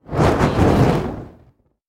Torch Throw Fireball

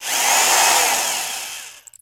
Electric Drill Run; Sound Effects Bible

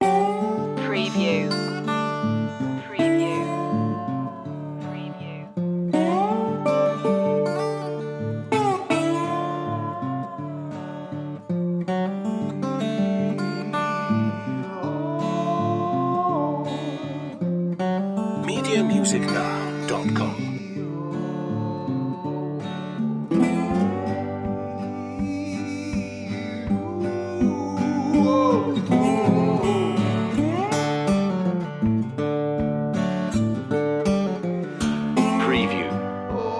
soul broke blues loop

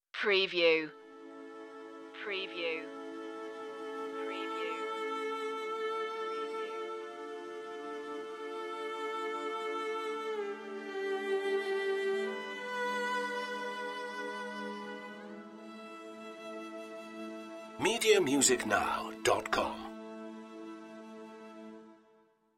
strings only solo violin

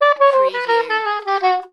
Sax Run Descend

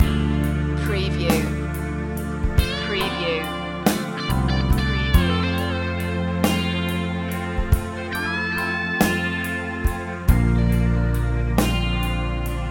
blues loop

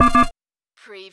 Servo Panel Sound - Keypad

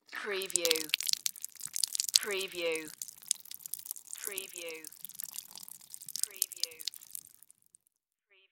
Cantaloupe Squeeze

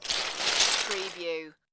Lego, grab several lego pieces

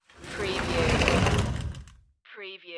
sliding door

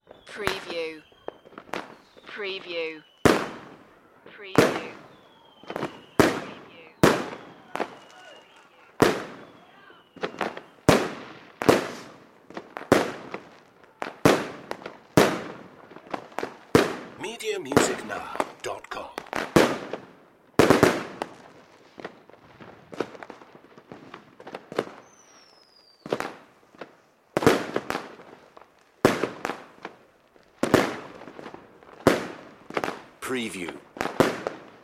firecrackers; Firework sound effects; the sound pack tree

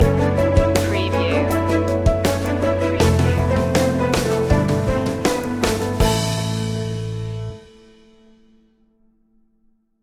building success stinger

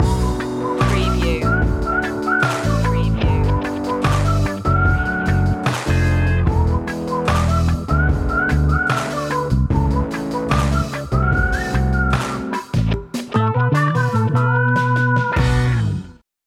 whistle lead

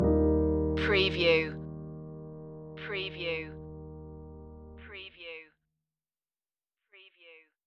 intimate piano stinger

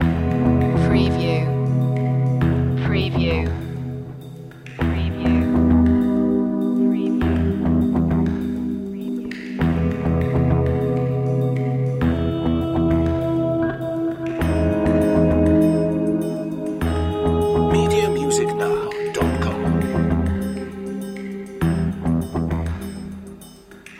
mystery bouncing loop

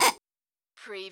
CARTOON SILLY HORN HONK

